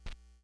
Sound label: Needle Drop, Vinyl Record Samples; Needle Noises